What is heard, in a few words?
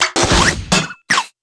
Clanging Noise; PC; MARIOTEACHESTYPING2